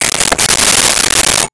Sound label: Rotary Telephone Dial; Communications